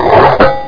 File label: rip; AVX demo